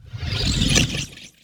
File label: Teleporter Nebula Cloud Exit; Evolve; Wraith